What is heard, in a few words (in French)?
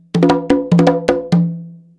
frise, congas